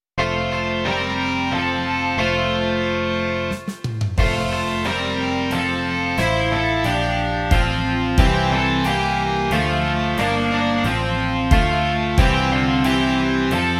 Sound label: rock